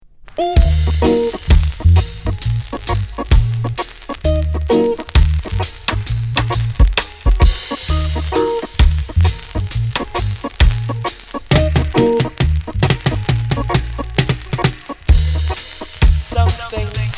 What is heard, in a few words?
GROOVY DUB